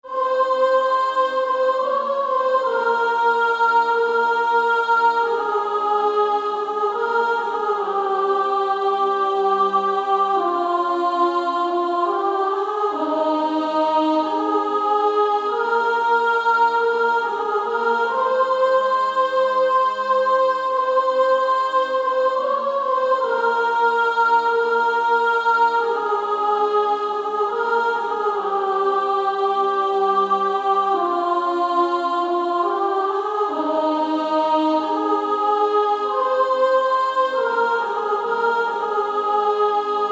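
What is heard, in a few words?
Female Choir, soprano 1; Yield Not To Temptation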